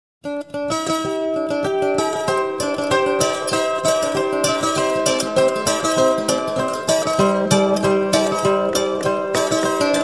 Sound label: sasando